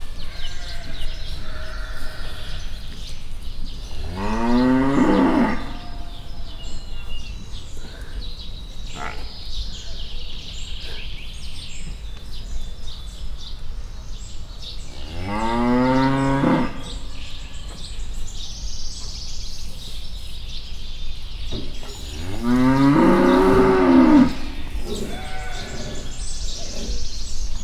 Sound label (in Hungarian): harmatbőg, magyar szürke szarvasmarha; miskolc zoo; miskolci zoo 2018